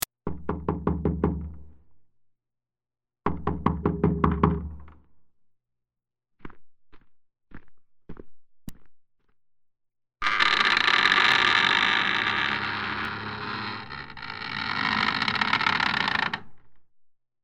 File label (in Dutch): deur